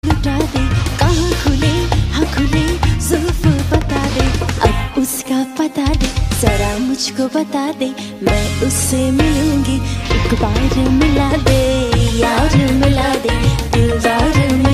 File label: pakistani ringtone